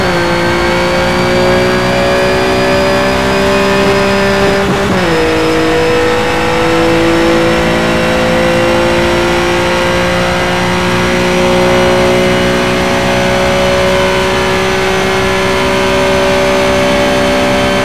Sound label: fourth cruise, porsche 911 rsr; vehicles cars; lwcars